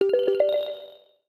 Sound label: notifier verse, notifications; Mozilla; Boot2Gecko